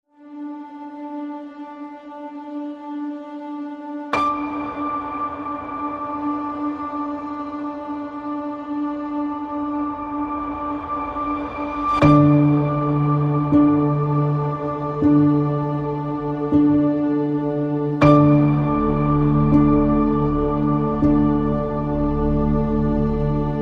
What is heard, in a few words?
No Pulses